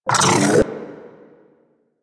Arch Gun Alt Charge Up; Weapons; Warframe; Archwing; Hildryn

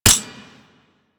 Anti Aircraft Gun Mech; Weapons; Warframe; Archwing

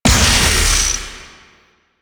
Archer Disengage Ability Explode B; Warframe; Duviri; Duviri Dax; Abilities

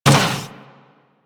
Combined Step Default, Footsteps; Enemies; Warframe

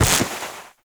Step Snow

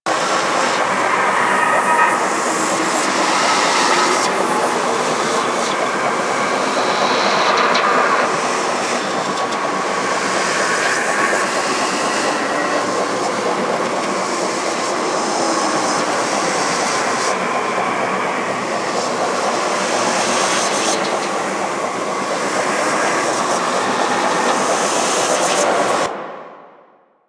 Orb Loop; Warframe; Zariman; Survival

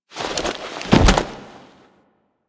Wake Up Fall Foley; Warframe; Sacrifice; Sacrifice Arsenal Wake Up

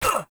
Voice Enemy Hit Standard Noise; Warframe; Caliber Chicks minigame

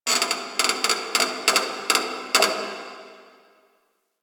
Metal Creak Small; Warframe; Quests; TheNewWar; Ambience; Zariman

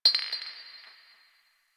Garbage Glass Drop; Warframe; Ambience; Nineteen Ninety Nine; DE

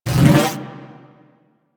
Ability Cast; Warframe; Warframes; Excalibur

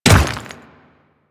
Royal Guard Dirt Step, Footsteps; Warframe; Grineer Royal Guard

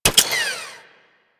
Mech, Weapons; Warframe; Tenno; Miter

